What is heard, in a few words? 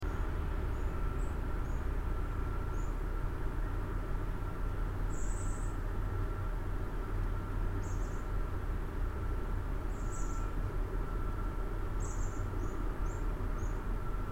chickadees ravens